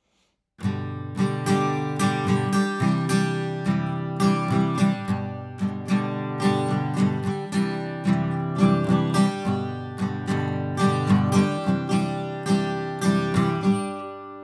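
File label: Lewitt RAY LCT640 no AURA guitar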